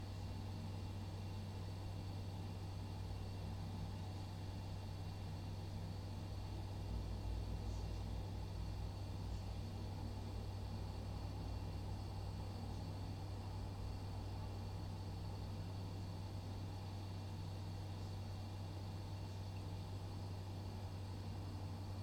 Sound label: Room Tone Kitchen Fridge